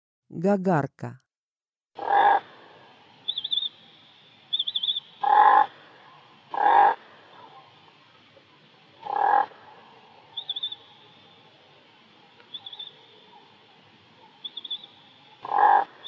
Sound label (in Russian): gagarka golos